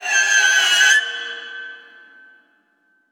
spooky sound

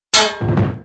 clank and bodyfall

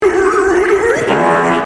fart

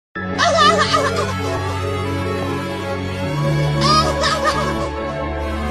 ngakak laugh annoy with music

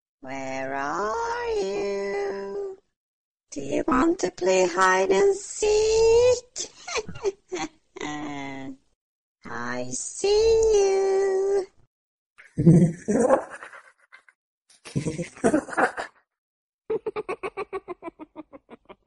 horror; granny

